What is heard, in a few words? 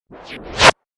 Turntable Scratching Whoosh; Dj; DJ (Sound Effects:Musical)